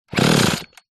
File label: industrial petrol powered power tool false start single